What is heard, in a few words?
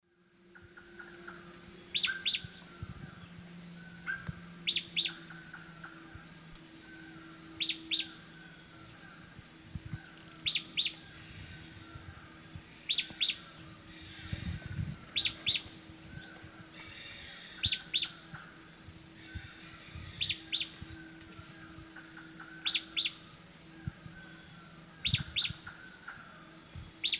Hylophylax punctulatus, calls pair; S. America; Eastern Lowlands